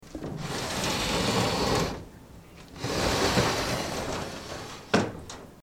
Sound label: sliding door